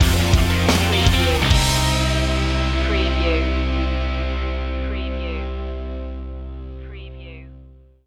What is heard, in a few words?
punk shuffle stinger